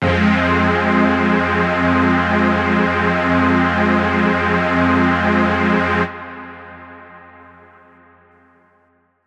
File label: Space Age Pad; Zero-G Sound Sense Grime Scene; 90 s Sample CDs